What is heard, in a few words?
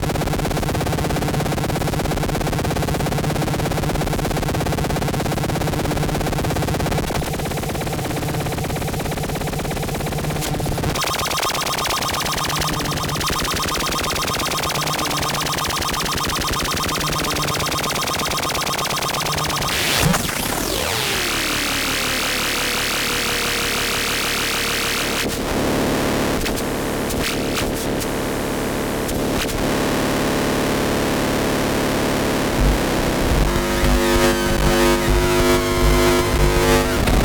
Preparatory test of the synth patch; used for a one-off/on-off audiovisual noise set; Noise Strobe